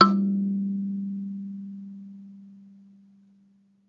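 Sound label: KALIMBA A4; ILIO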